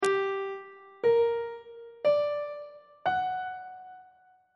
f double sharp minor major 7th chord intervals on treble clef